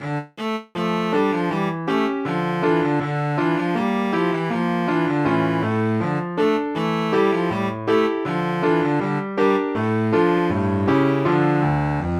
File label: gavotte, cello; suzuki cello school